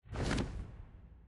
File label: Phantom flap; CWSM+; Phantom Noises